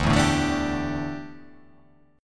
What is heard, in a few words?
D Suspended 2nd Suspended 4th D down, chord; strummed